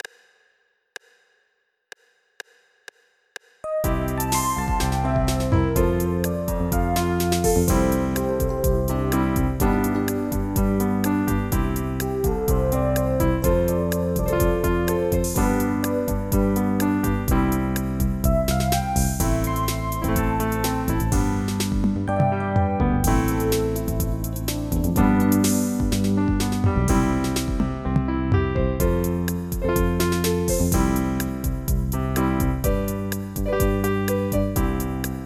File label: slow pop